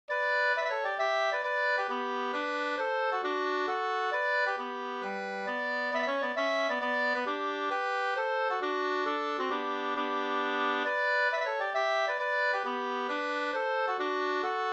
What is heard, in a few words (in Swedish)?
hambopolska